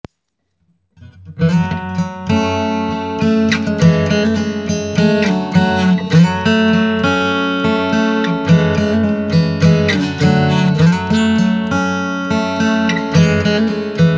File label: Acoustic capo 2